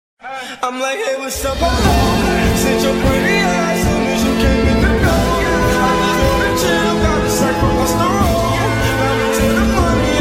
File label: Trap